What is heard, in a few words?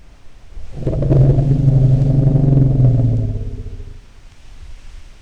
elephant noisy; animals; disneyhand; environmentality; trackingcenter